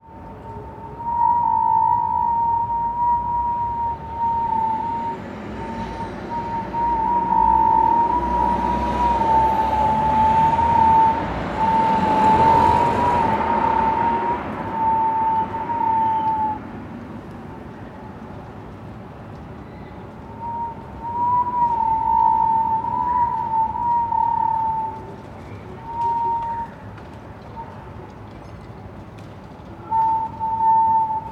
nature wind howling in door of building street noise; felix blume